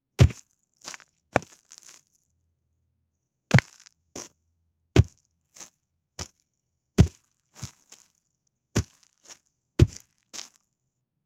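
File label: clean and realistic, farming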